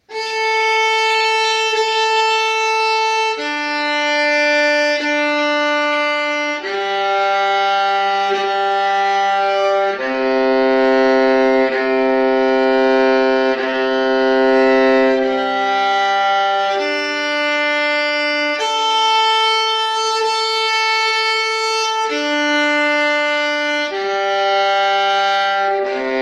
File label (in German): bratsche